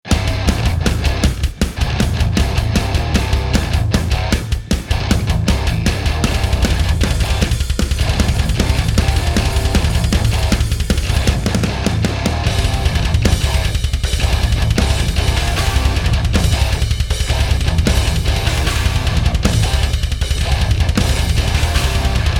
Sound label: METAL